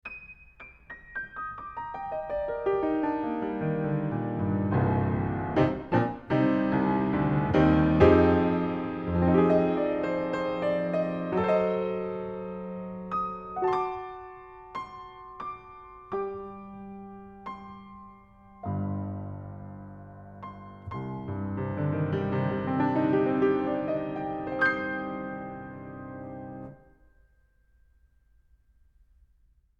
Piano, Close Outside Pair

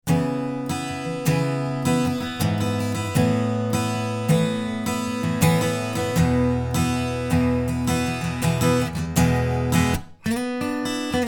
Ac Gtr

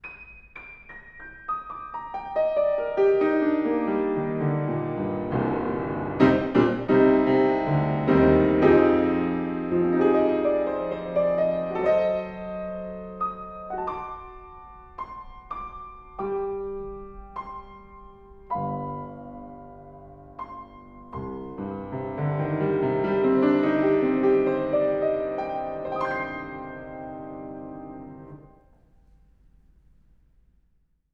Piano, Horiz Closed Mic

